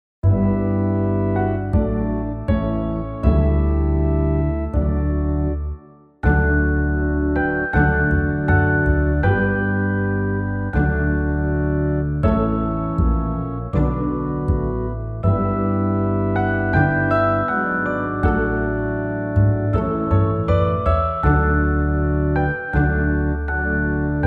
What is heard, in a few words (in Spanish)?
Coral de organo